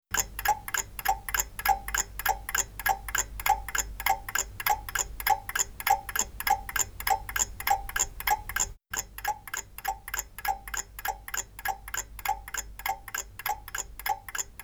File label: neu tlm103 noise; Test mikrofon